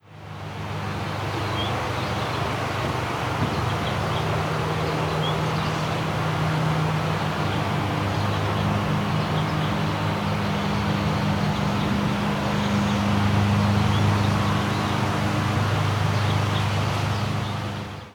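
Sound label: Dierkow Town ambience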